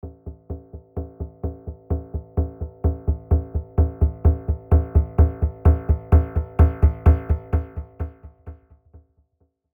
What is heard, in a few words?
Riser Robo Drums; robo pulse riser; Evolve